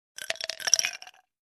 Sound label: Filling Ice Cubes in Drinking Glass